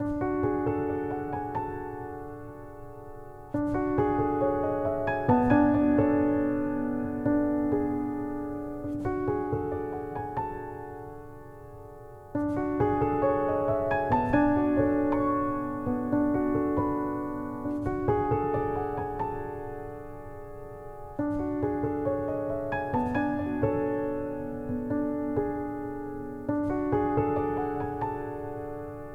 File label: Piano Arrange; Death's Gambit Afterlife